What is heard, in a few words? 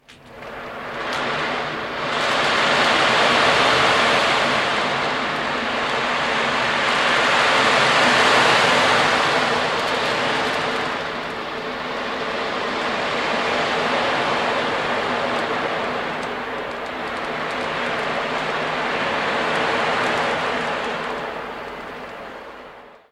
Oceandrum